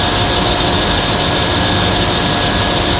Machines Large

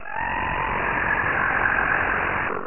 pain; mystic radar; mr zombie1

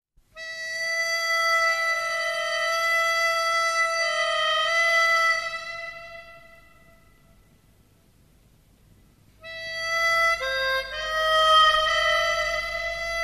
ambiance harmonica

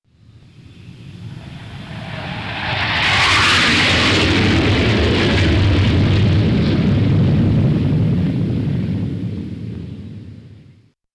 f16 flyby

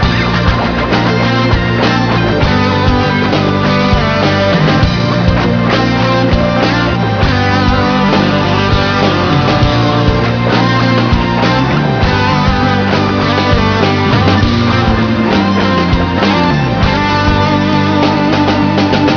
Oriental Melody

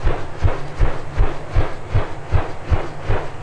fan blades

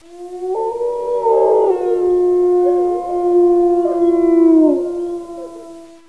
wolf

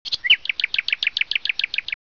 bird; cs hokkaido; jap

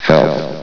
health

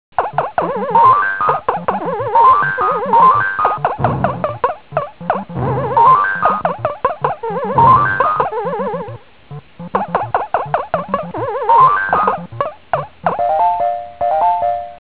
walk jump; donkey kong

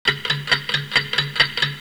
tictac

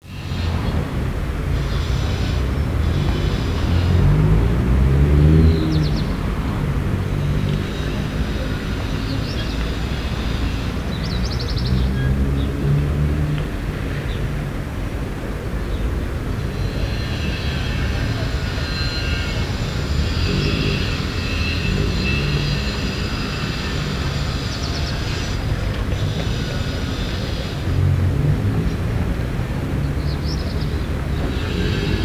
streets; city scope

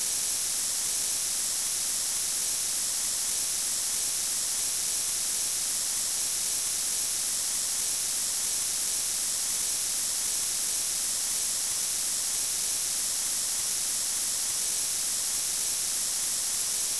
smoke ssss

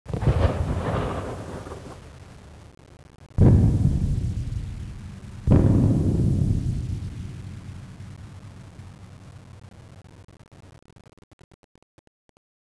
bazooka ambient

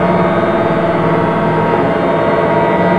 amb whirr; filtration

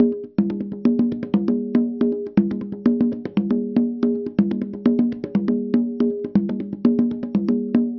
hand drum

